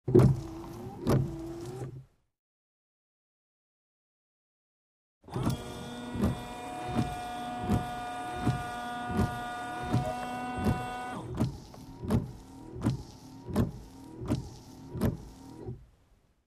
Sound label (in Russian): Avto dvorniki zvuk podachi vody